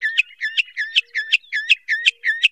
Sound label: Bird Call